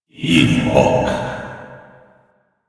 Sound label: Alt Fire Whispers; Warframe; Tenno; Grimoire